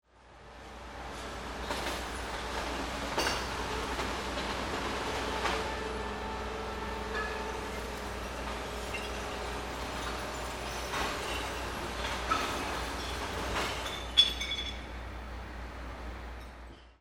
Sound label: VEHICLES